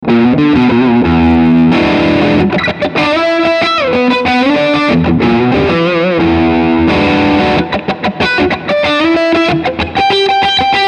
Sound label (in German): beide PUs im Overdrive; lag roxane racing 1500